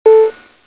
Single tone (navigational notification only in dialog box); Tab key in dialog box